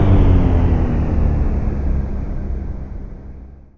powerdown

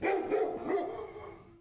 dogs

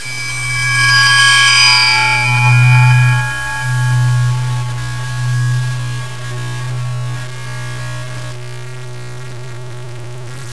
scary fear

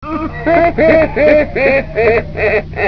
gar flame off

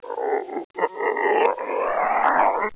pyro die

